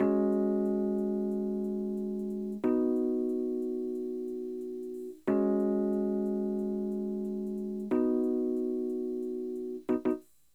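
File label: rhodes, G#, Hip Hop & RnB; ProSamples vol.34 - Hip Hop & RnB 3; Okey Dokey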